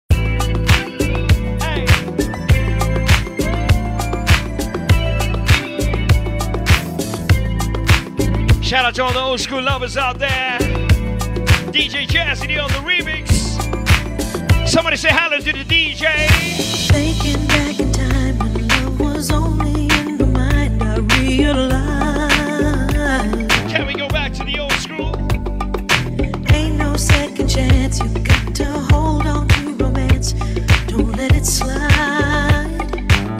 jazz remix

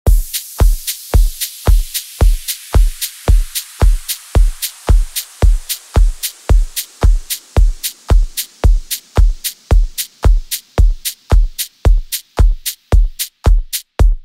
club mix

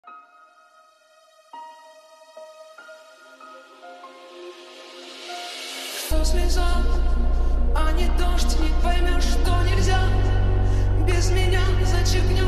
hardstyle slowed

